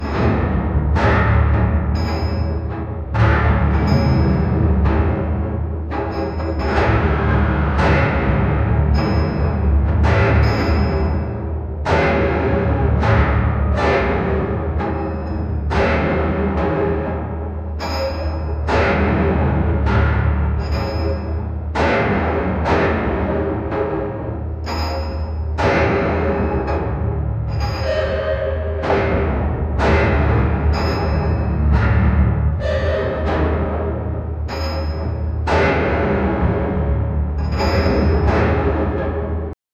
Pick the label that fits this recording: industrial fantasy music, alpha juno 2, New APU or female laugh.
industrial fantasy music